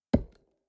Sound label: lumber tree